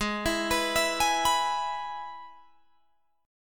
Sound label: Ab Minor Sharp 5th Ab down Guitar Open G